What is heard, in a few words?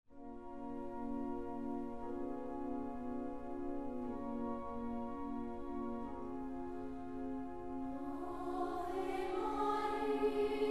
Chorus; Ave Maria